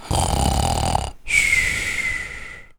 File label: snore; DirectX SDK